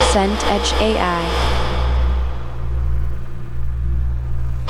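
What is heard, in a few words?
ambience carpark underground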